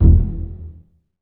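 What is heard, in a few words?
bass pizzicato E1, Virtuoso Strings; ILIO; Kirk Hunter Virtuoso Strings VOL-3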